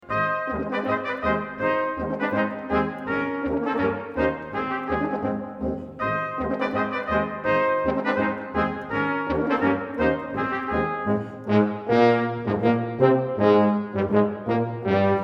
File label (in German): Persische Marsch